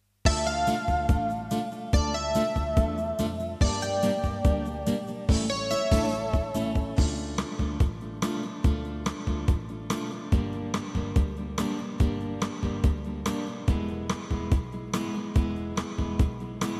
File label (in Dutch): Lied van de maand karaokeversie